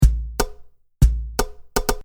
Loop

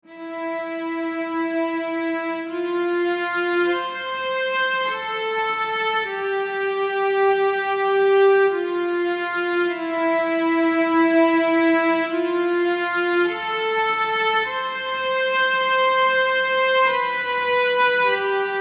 Cello section

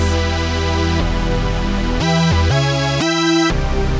pad saw

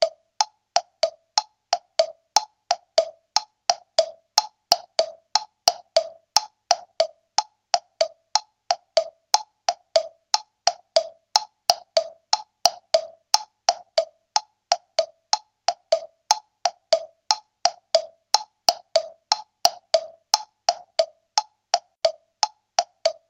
Batucada Temple Block

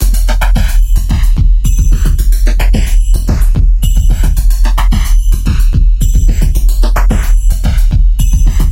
bassline drumloop; bassline project

